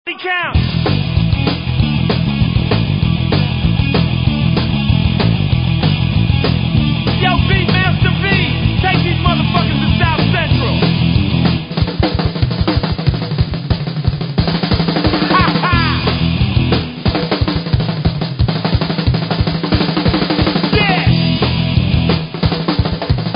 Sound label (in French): Solo Batterie